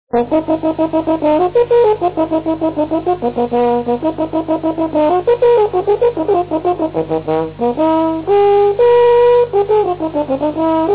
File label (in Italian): corno; inverno